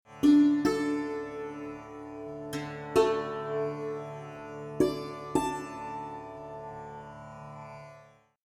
Swaras Pa Drone; ragas; ragajunglism

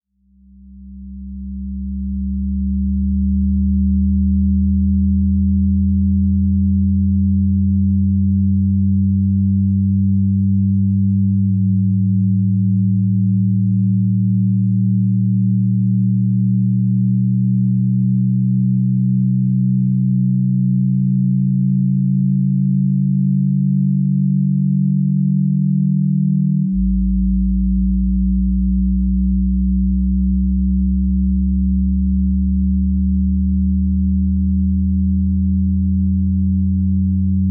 binaural example

